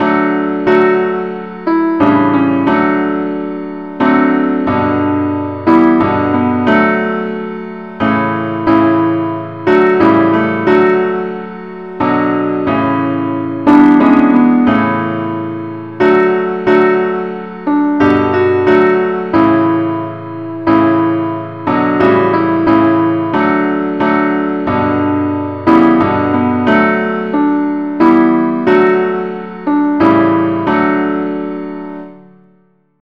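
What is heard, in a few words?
simple accompaniment key D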